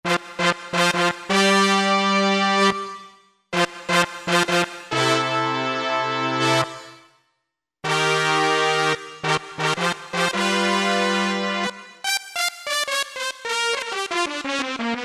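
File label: Brass Section; Korg MSC-8S Percussion; BrassSectn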